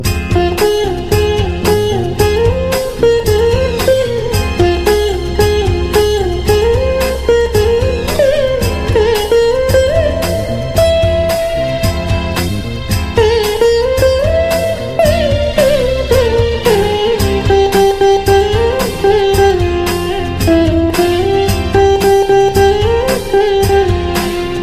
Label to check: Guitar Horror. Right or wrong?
Right